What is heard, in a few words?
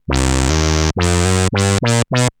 Arp D Line, ARP Odyssey; musicradar-synth-samples